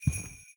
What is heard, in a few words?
foot; Phasmophobia; Santa